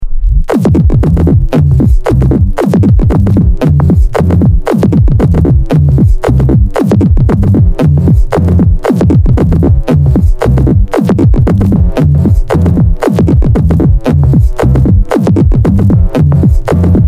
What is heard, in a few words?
Bass Drum Sound Test